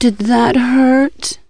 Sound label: HURT; REDNECK; RAMPAGER